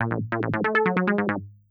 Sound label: TECHNO SYNTH 140; Keys